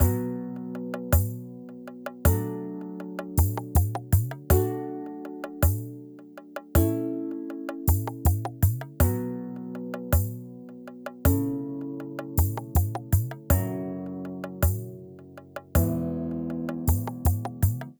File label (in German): Beat Umland; Gods of Akragas